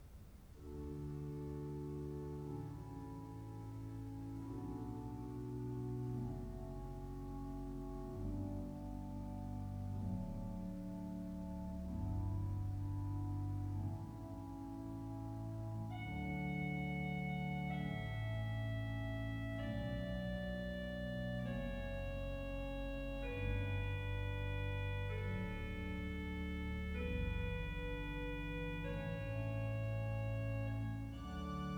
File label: Organ Canon in D; Pachelbel; Entrance and Signing